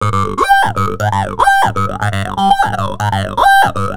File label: Throatline, Industrial; Nasty